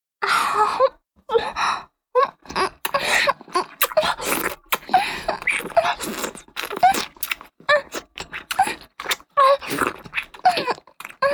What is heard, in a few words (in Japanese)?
フェラチオ(中):頭を前後に往復して陰茎をしゃぶる, チュパ音; チュパ音抜粋集